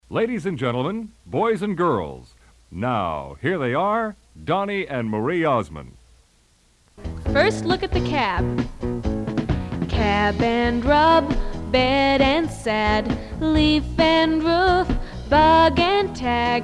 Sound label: Song 2 Sounds and Letters; Reading Thru Rhythm & Songs - Book 1